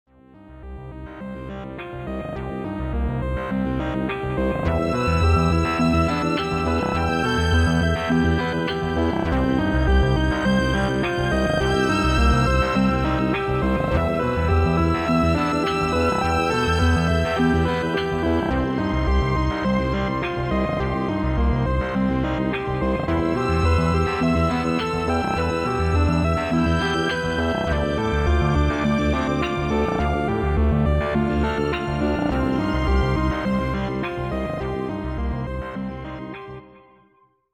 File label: ws split